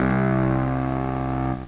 roland d50 wild blow, synths; Zoom - Release 2 (1996); Active Software

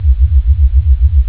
waber, synth

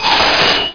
sound thaw; MOTS

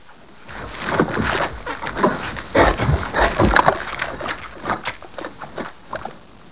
EAT; Talking E-Mail

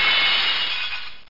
GLASS

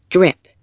drip

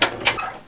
Locker; Florida State Football - Ultimate Multimedia Experience

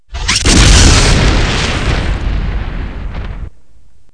LASER AX; REDNECK; RAMPAGER

